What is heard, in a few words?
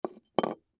Make Up Set Down Table; Objects; Foley; Blastwave